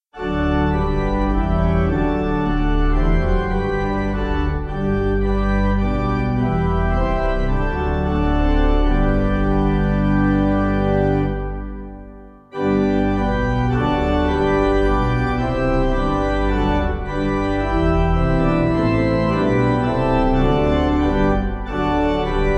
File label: Pipe